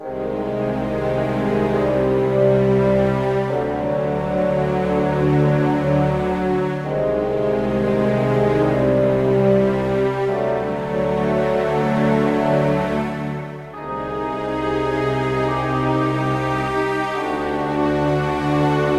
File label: Ambient Loop; Chrome